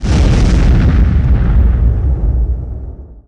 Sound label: nuke; SuperPower 2 Redux V7A